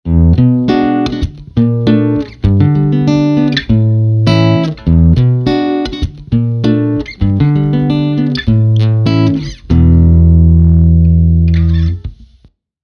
Walden T550 more Bass less Treble and Brilliance

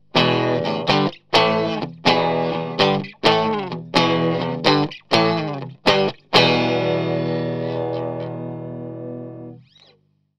Strat Amp; Fredenstein Magic Pre; e606 color

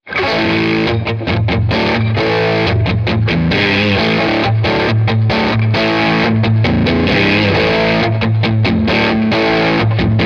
Crunch Dream modified

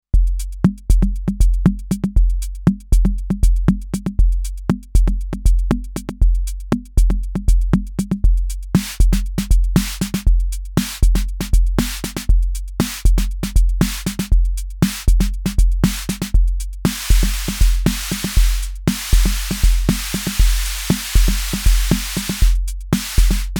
Akai Rhythm Wolf Snare

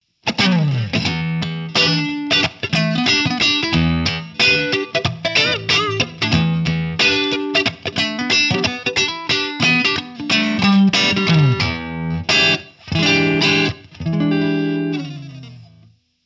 Hughes Kettner StompMan Clean

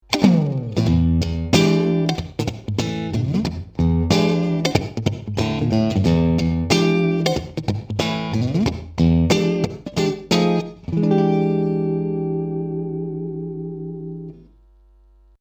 Neck Single Coil

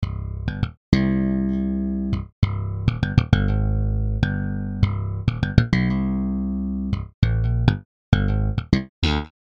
Slap Bass

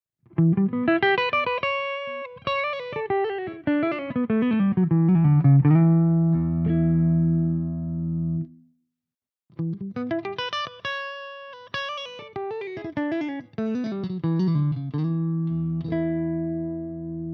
PIA clean melody all positions

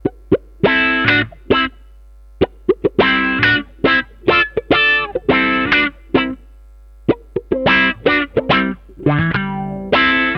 wah

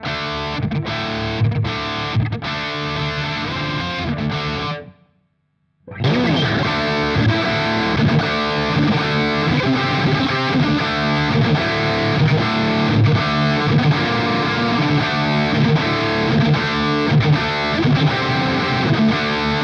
Vox Valvenergy Copperhead Drive FX Loop

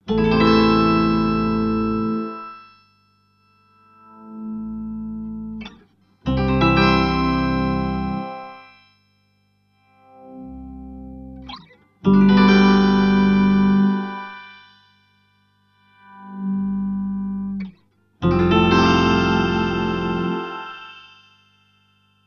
EHX Pico POG HPF Range Sub und Octave Up

